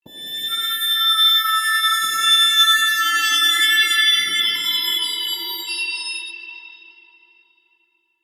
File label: Dark